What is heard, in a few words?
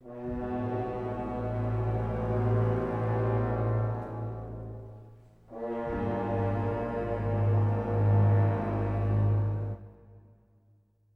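sfx ambience background stinger symphony; Hellpoint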